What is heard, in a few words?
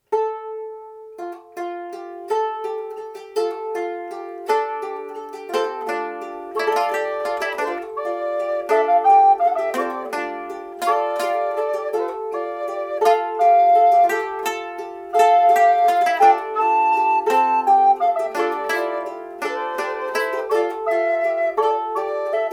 ground bass melody